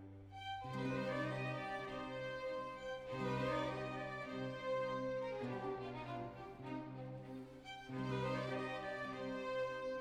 Sinfonia - Andante; Antonio Vivaldi (1678 - 1741); Vivaldi - Armida (2010)

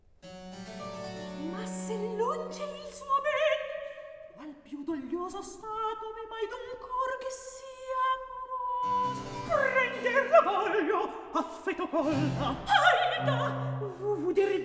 Recitativo; Scena 5; Angelica, Orlando, Alcina, Ruggiero, Bradamante; Antonio Vivaldi (1678 - 1741); Vivaldi - Orlando furioso (2004); Atto III

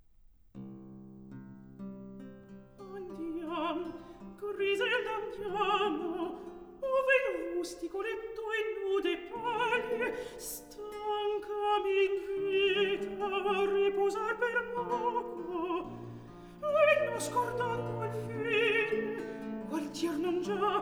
Recitativo; Atto II; Scene 4; (Griselda); Andiam, Griselda, andiamo

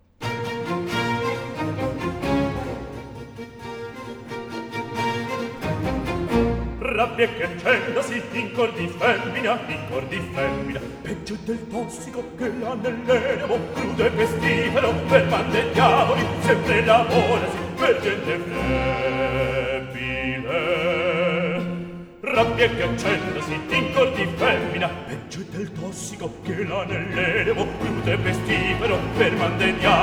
Aria; Atto 2 - Scena 7; Lindo; Rabbia che accendesi; Antonio Vivaldi; Tito Manlio (2005)

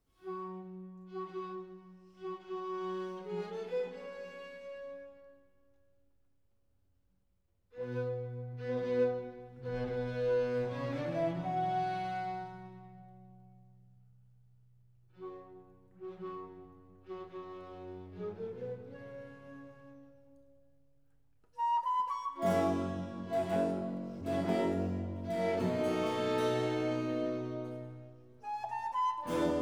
Concerto in Sol minore, Largo; RV 104 'La Notte'; Antonio Vivaldi; Concerti e Cantate da Camera (2002)

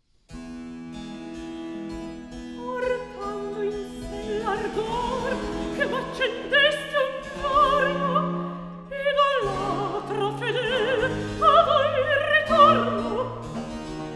Cantata, Recitativo; Cantata, RV 682 'Vengo a voi, luci adorate'- II. Recitativo; Antonio Vivaldi (1678 - 1741); Vivaldi - Concerti e cantate da camera III (2005)

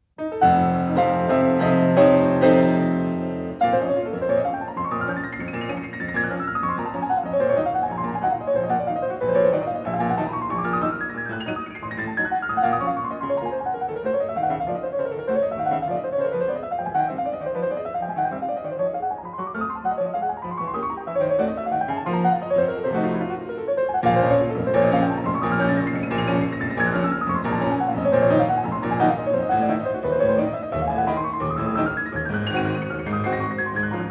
Preludio in si bemolle minore, op. 28, Piano; Chopin - Piano Works - Vladimir Ashkenazy

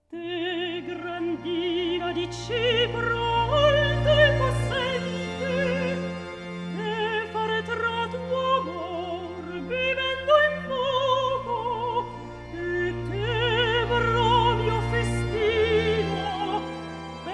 Recitativo accompagnato; Atto II; (Medoro)

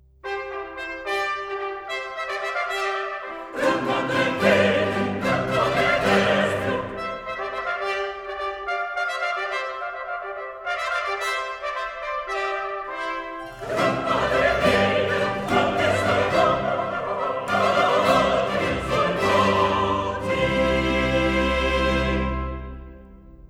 Tutti (Coro); Gran madre venere; Antonio Vivaldi (1678 - 1741); Vivaldi - Orlando furioso (2004); Atto II

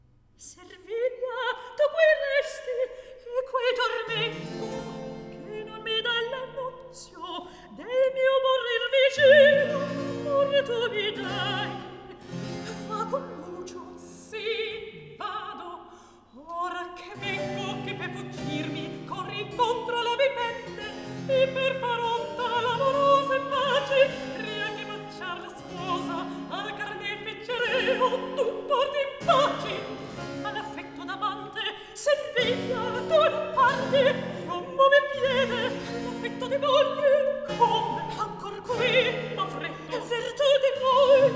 Recitativo; Servilia, tu qui resti. E quel tormento; Antonio Vivaldi; Tito Manlio (2005); Atto 3 - Scena 3

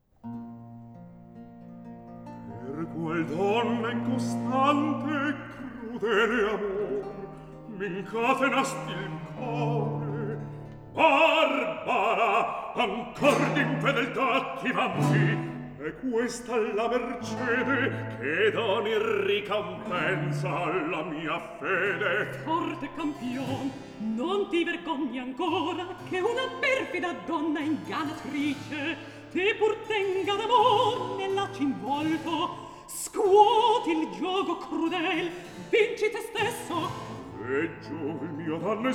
Recitativo; Astolfo, Bradamante; Antonio Vivaldi (1678 - 1741); Vivaldi - Orlando furioso (2004); Atto II

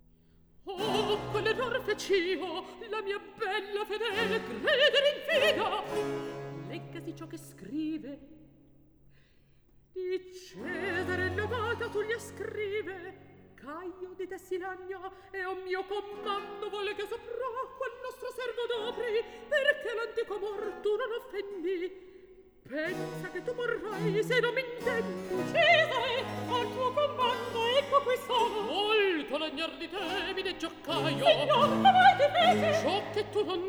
Recitativo; Atto II Scena 10; Ottone, Caio; Antonio Vivaldi; Ottone in villa (2010)

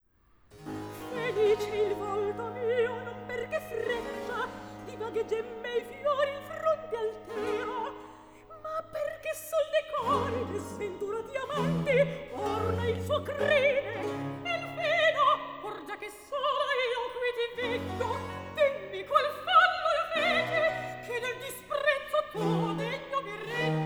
Recitativo; Atto II Scena 6; Cleonilla, Caio; Antonio Vivaldi; Ottone in villa (2010)

